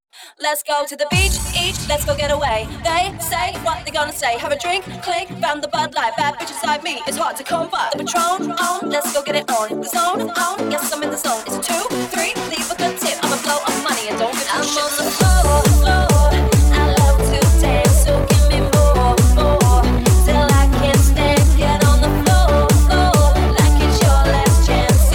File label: disco; Duo children disco 2015 - 2016